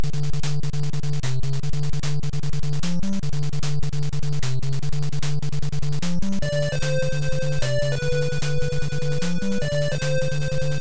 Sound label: Main BGM; Hit the Ice; NES; Major Teams